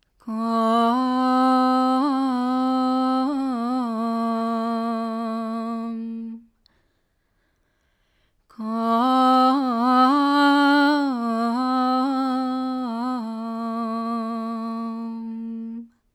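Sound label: female vocal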